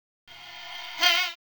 Vox, Trance; Sonic Foundry (Sony Creative Software); Trance NRG VOL-1

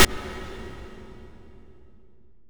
trip hop snare; Trance Explosion Vol1

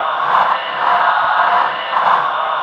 DIVE MASK, UNDERWATER; Spectrasonic Distorted Reality 1

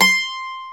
MANDOLIN; E-MU Producer Series Vol. 2; More Studio Essentials; Composer

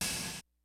HITS BITS; Kid Nepro - Elite

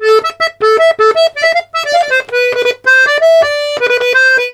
LARIDE, Accordions; USB Soundscan vol.40; AKAI

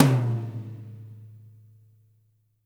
TOM 2I L; TOMS; Spectrasonics; Burning Grooves; BG TOMS

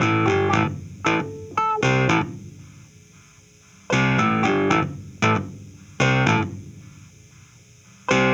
Cruisin' Disco Clavinet, 70s Analog Funk

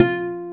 W BASS F3, Bass Guitar; STACK BASS; AKAI S6000 CD-ROM - Volume 1

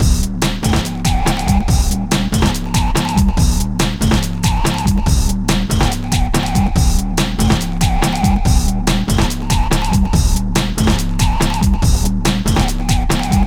Method Machine, 142 Cmin, Hardcore Breakbeat; Big Fish Audio - Hardcore Breakbeat Action

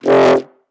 bass tweak burn F; Polyend; ARC Noise

